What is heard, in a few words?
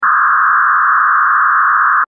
Spaceship